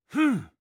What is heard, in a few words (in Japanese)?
攻撃